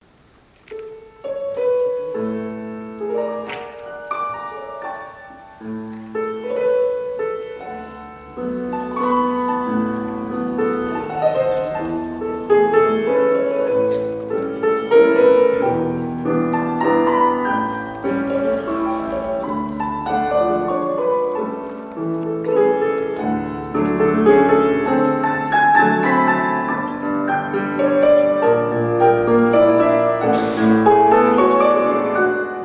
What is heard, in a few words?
battement fondu frappe